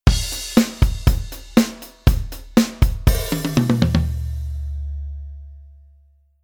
Drum Set Basic Drum Sample

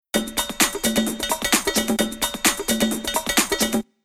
Rhythm Loop

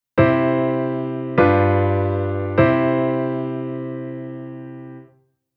Chord Cadence

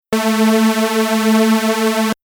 Synth Additive Saw Unis

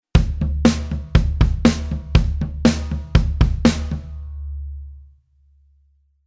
Rhythm 8beat

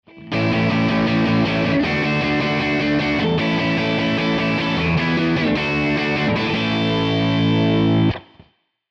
guitar down

